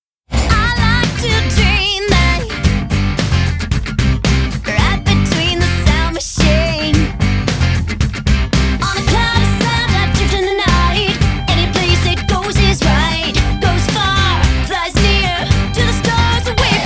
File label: Singing; Ubisoft